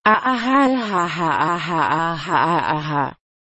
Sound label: a aha ahaha a ha ha aha